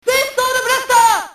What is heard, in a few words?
space sword blaster